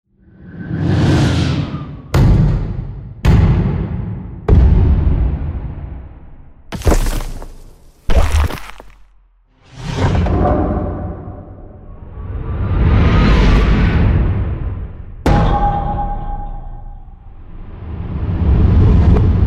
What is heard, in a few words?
Whooshes Stingers Hits